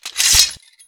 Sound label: Inf Weapons; Red Orchestra 41-45